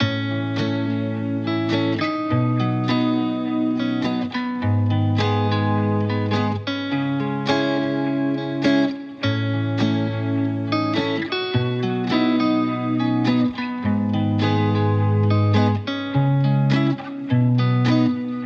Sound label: 104 BPM C# Maj, Guitar; Love; Cymatics